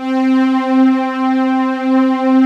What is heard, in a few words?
S STRING PAD; Z1 PADS; Pro-Rec - Synthsonic